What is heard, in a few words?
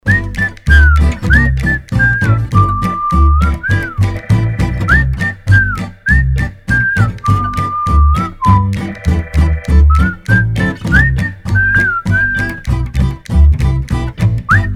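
Whistling; Courting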